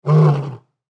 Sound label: Lion Roar Rumble; Big Cats; Big Cat Sound Effects; Animal